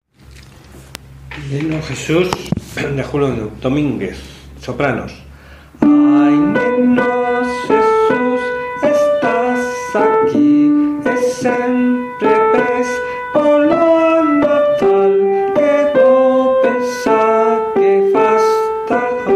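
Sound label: soprano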